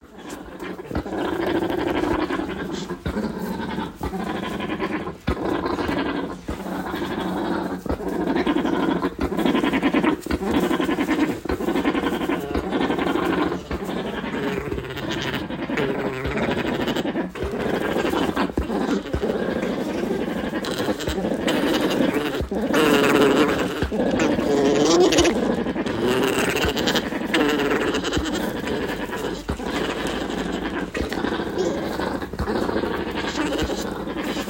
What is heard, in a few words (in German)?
Deckgeraeusch, Alpacas; Junghengste; Deckhengste; Harmony Alpacas